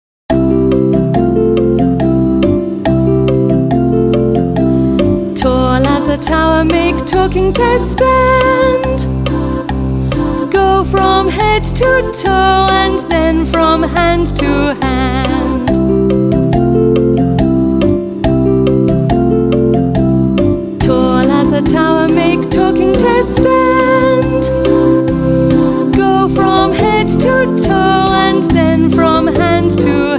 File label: Handwriting Song